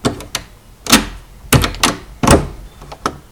Door Open; memory-sound; gcompris-qt

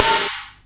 drums; fofix

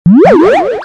error

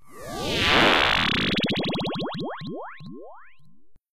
warp, effects; lmms

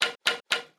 knock; gcompris; melody

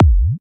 bassdrum; lmms; drums

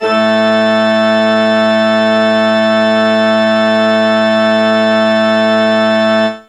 church organ; instruments; lmms

